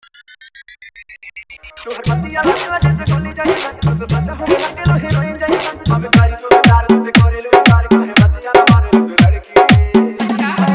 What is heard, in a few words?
Old Trending Bhojpuri Dj Remix Song Hard Faadu Mixx